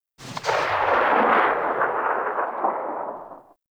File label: RPG Tail; Warframe; Grineer; Hellion Elite